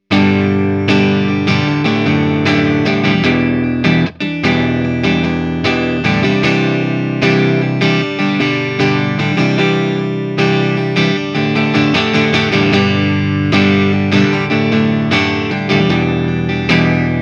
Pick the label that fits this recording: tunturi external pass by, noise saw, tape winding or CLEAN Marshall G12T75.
CLEAN Marshall G12T75